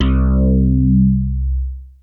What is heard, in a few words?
Big Synth Basses